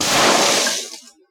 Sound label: aluminium shake